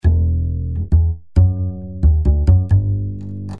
upbass A

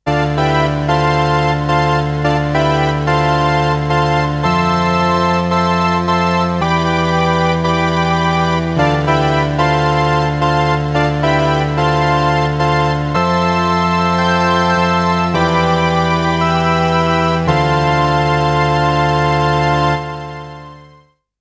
Siel Orchestra Reed Sustain

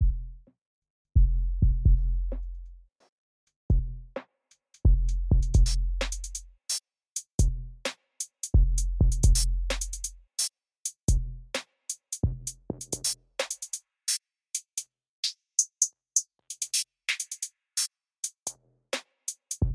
Master sweep filter sound

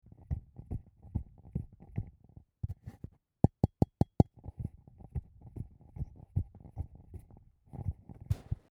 Neumann KMS 105 Handling Noise

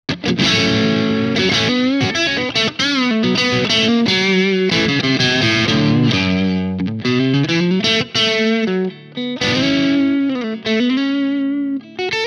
Mooer Preamp Model X Clean; PlexiRanger

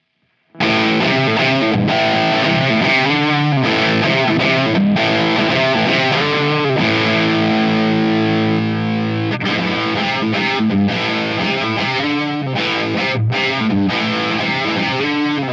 NOS V30 Spread Twin Tracker; Torpedo Captor X SE

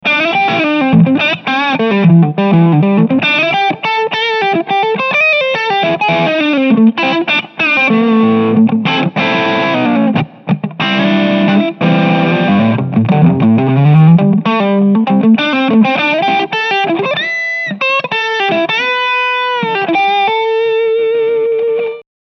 Preset Dirty Blues

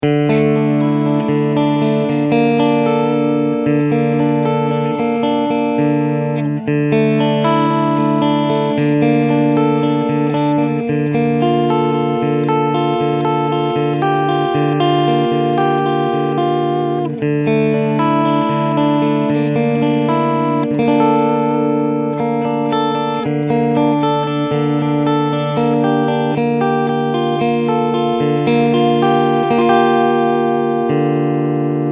Cleansound

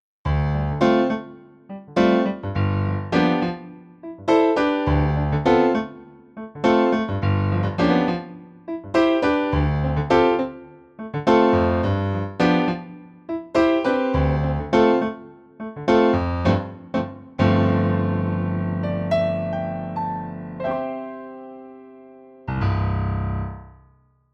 kawai cs10 concert grand

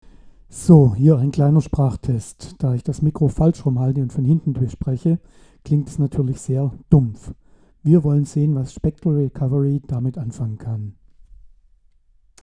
Spectral Recovery

